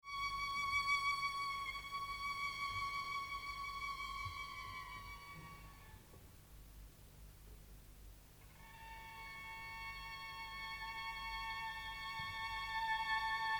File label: concerto for violin and orchestra